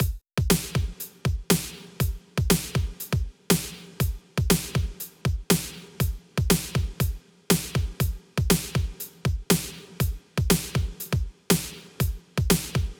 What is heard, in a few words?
drums